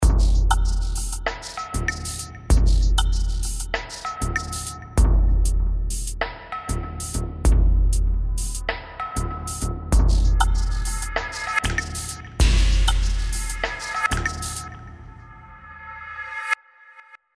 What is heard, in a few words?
Punch Film Score & TV; Punch Filmscore & TV example 4